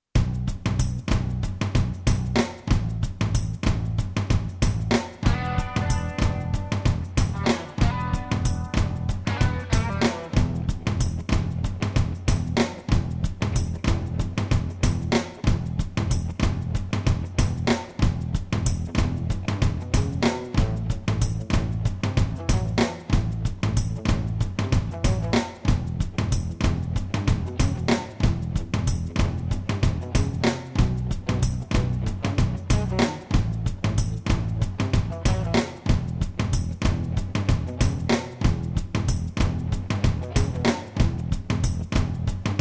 Style in E

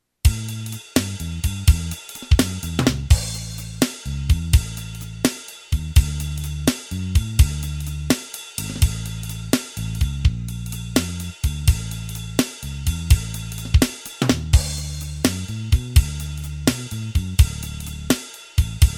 Fast

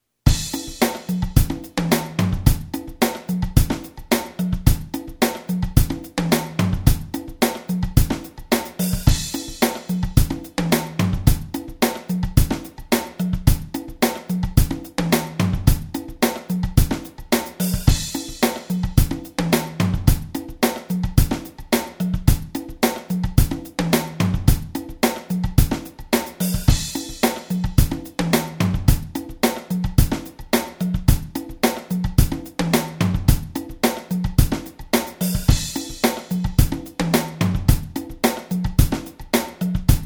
Acoustic Fast

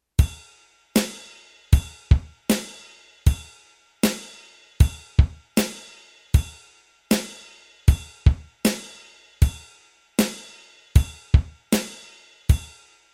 Rock Slow

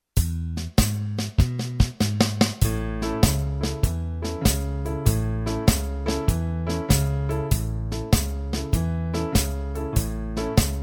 Blues Shuffle in A Med

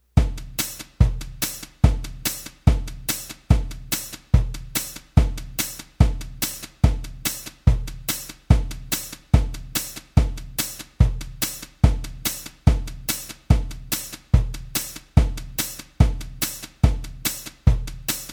Country Boom Chicka Med

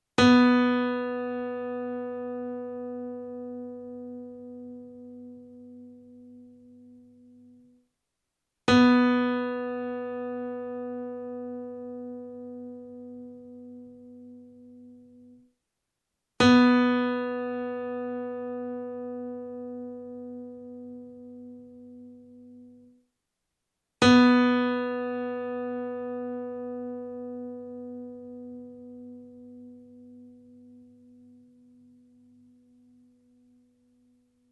2nd string B

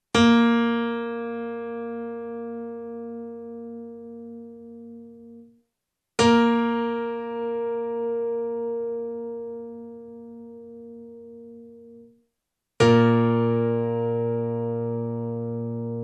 Concert Bb

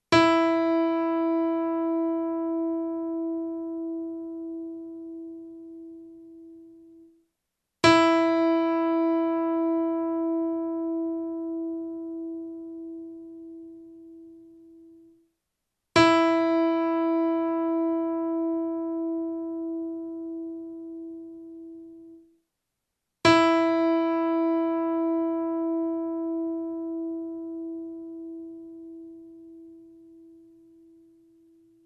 1st string E

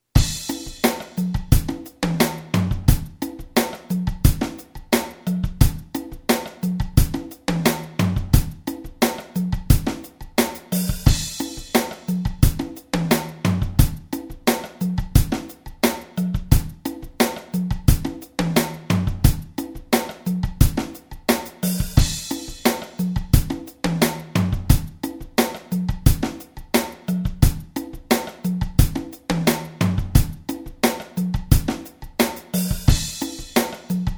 Acoustic Med

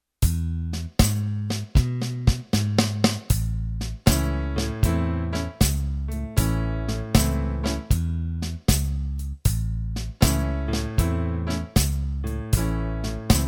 Blues Shuffle in A Slow

